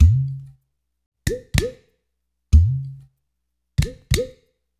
93 MISH, Misha Udu; Spectrasonics - Supreme Beats - World Dance

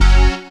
SYN, dance; Sound & Vision - Gigapack II

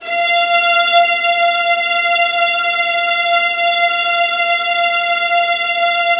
VIOLINS G, Strings; ILIO; Synclavier Strings

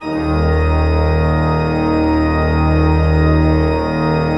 D1, Cathedral Organ; ILIO; Propeller Islands Cathedral Organ

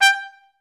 TRS STAC G4; Best Service; Peter Siedlaczek's Advanced Orchestra

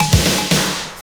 118 4-4, Hyper Dance loops; Best Service

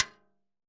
Timbaleta Cascara; Best Service; Festa Latina VOL-1; Single-Hits

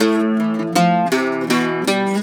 Celtic Flavours; USB Soundscan vol.42; AKAI

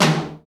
TOM TOM; 90 s Sample CDs; Sound & Vision - Gigapack I CD 1 (Roland); Real-Kit s10

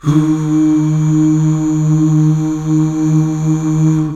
UUH

